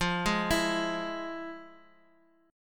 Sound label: F Minor Major 7th, chord; FmM7 arpeggiated; JGuitar